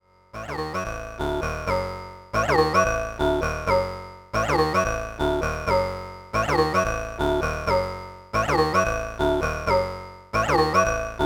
Rossum Electro Music Morpheus Sweep

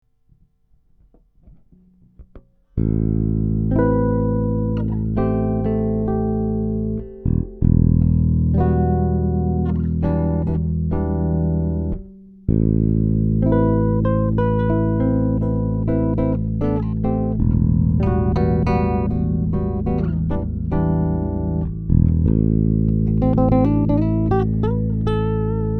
High Chords

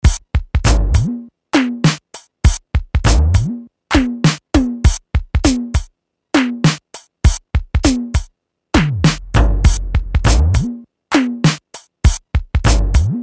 Oberheim DX

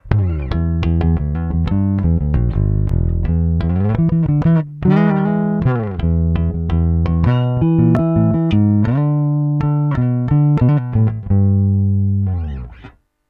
Neck passive consolidated

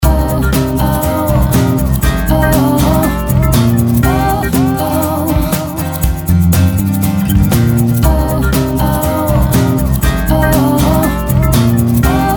Song Vintage Limiter

